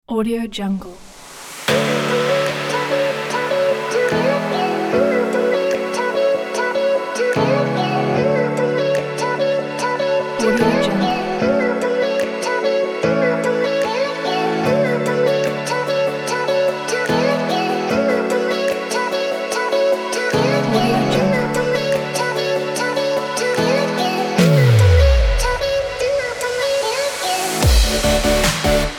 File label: future bass pack